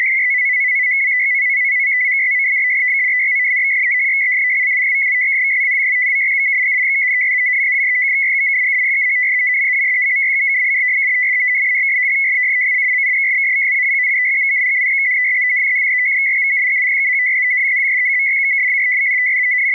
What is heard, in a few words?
100bps 185hz